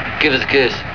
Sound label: GIVE KISS